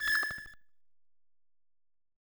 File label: Menu Click